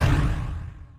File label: miss